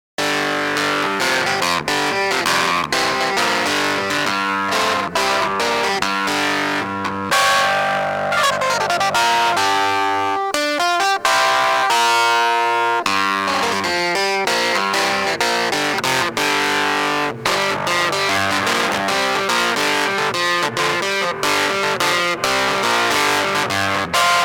Behringer 646 Clavinet Overload

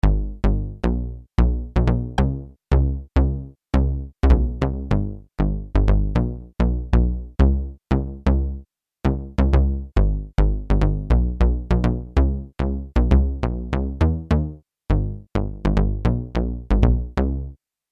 pulse Bass

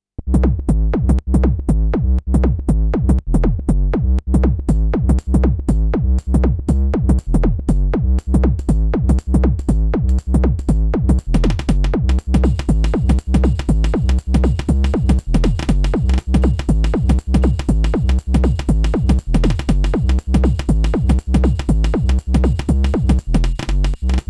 Erica Synths LXR 02 Flatbeat; Sonic Potions

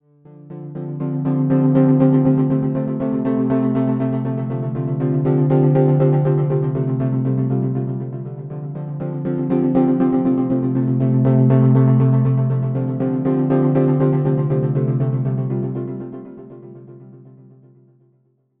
PS-3300 Gentle Pulse; Cherry Audio

